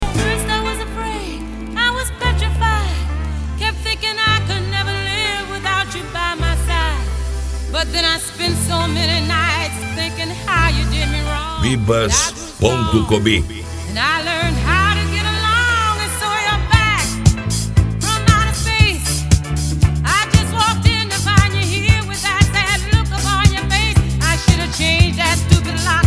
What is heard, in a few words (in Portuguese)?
sound car carnaval